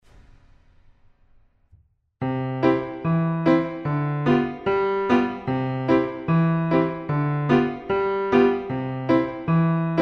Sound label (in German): Staccato Uebung